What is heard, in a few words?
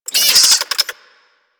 Infested Minion Pain, Vocals; Warframe